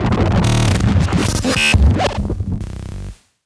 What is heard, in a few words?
Glitch; glitches